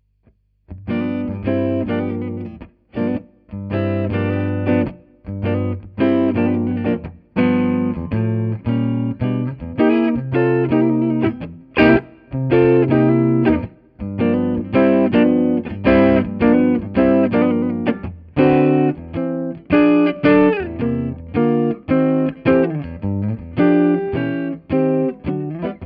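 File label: clean neck, humbucker; schwarzbach; HB59-GrowlDogs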